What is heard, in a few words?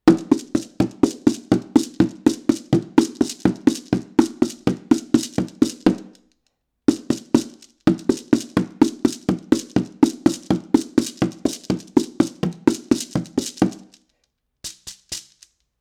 Percussion; AEA R44C